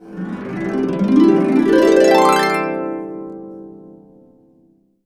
FANCY GLISS, CASCADE UP, Complete Harps; USB Soundscan vol.62; AKAI

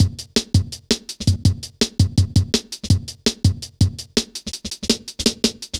Skippy, Drum Loops, Jungle; Zero-G Jungle Warfare 1, 2 & 3; Skippy A 165.5

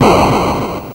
Atari Noise Long, Atari 2600

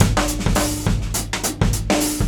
DRUM

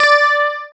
GUI, E-Guitar

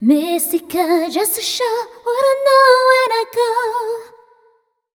f 142 mel ais, fem Vocals; Mutekki Media; Diamond Vocals 2

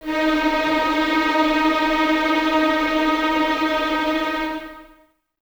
Violins Tremolo D#4; 23 Violins; String Ensembles; Miroslav Vitous

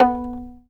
fiddle pizz B3 L; Sampleheads; Whole Lotta Country 1 & 2

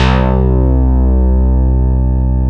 COMP G C2, SYNTHESIZER; GLUNGE

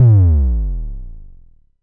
DSM2 Sub; MPC DSM-2

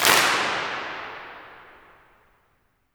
CLAP, MONDO CLAP

